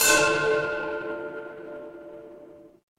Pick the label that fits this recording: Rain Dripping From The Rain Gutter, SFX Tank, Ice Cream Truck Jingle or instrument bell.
instrument bell